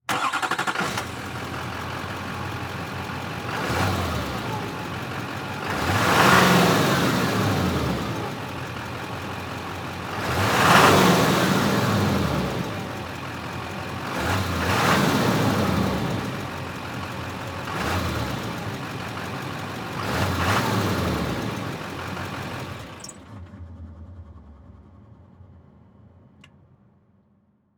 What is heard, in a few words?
Diesel Truck Idle Rev; transportation